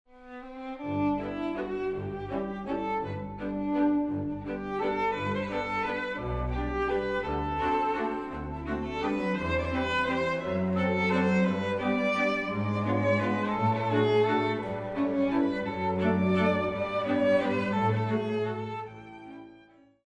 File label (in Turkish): santral fon muzikleri